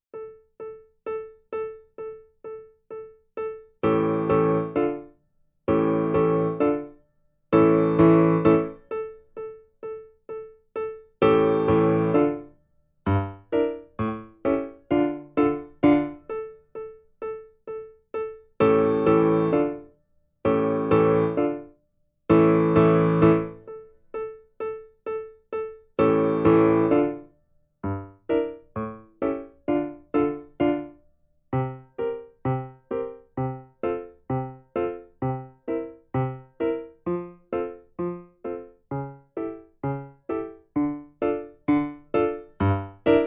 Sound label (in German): Secondo, Vierhaendige Uebungsstuecke; Diabelli